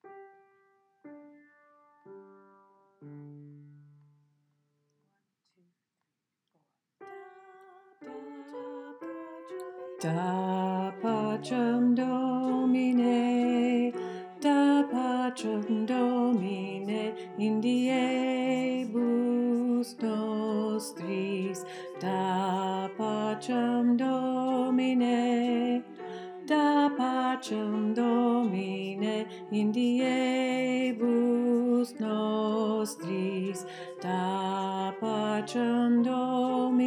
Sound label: Tenor, choir